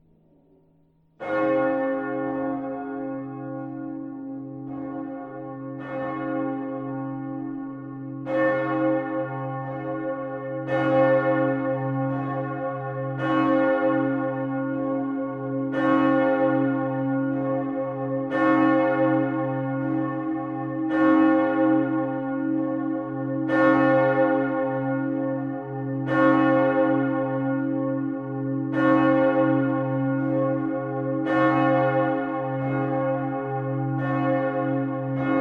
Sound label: bell south tower leopold